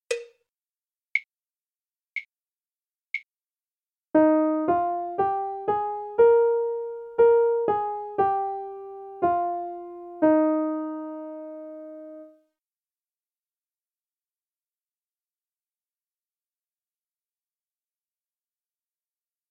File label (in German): Melodie